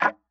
Tick, Clocks Kitchen Clock

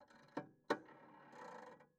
PayPhone

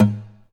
Classical Guitar Damped Pluck

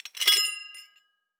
Hole Saw Rattle, Hole Saw Bits

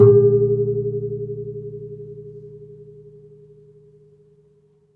Classical Guitar Ruler Thread; Classical Guitar Extended Technique Stereo

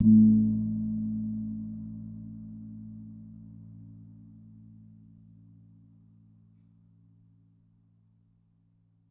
Kempul, Gamelan

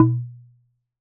Wooden Bar Strike

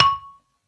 Flower Pot Soft

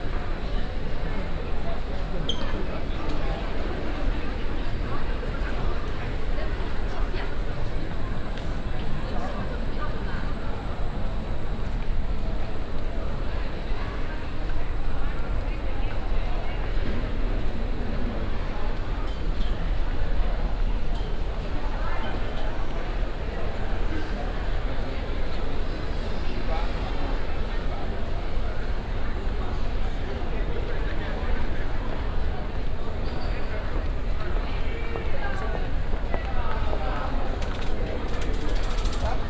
Departures, airports airplanes; Frankfurt; Sound Scapes

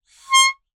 Melodica Short Note

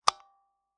Taxi Meter

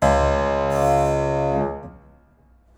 String Pluck Buzz, piano; Piano Miscellaneous

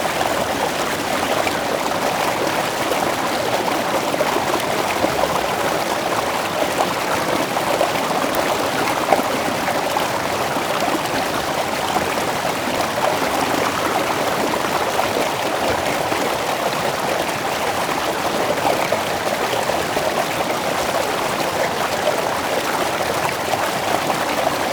River, Static Microphone

